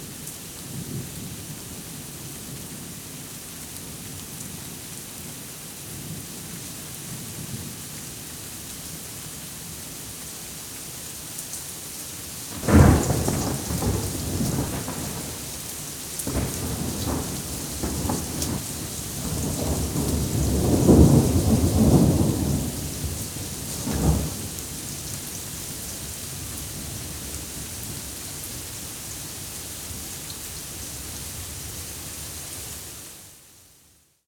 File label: rain thunder; best service Blue Box